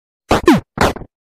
enter exiting car

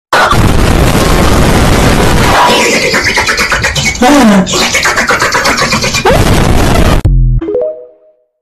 water

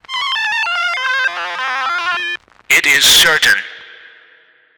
8 ball sfx

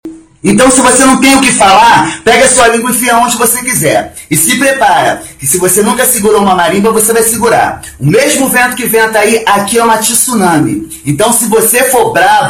marimba

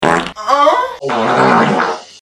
fart moan wet

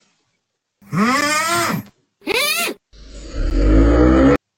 augh high pitch

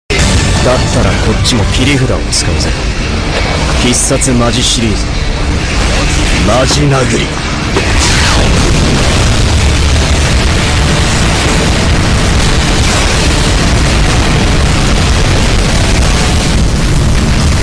punch

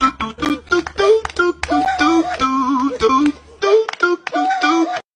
dudududududu dududu

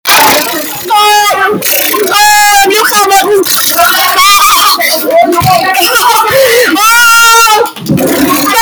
ahhhhhhhhhh

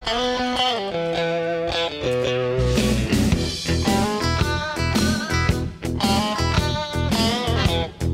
background music

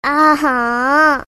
cry

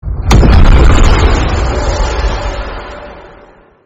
rift spawn sound

